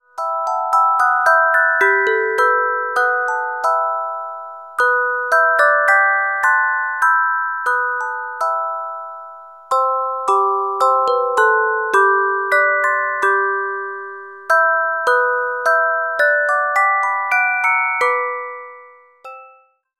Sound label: Carillon Music Box Melodies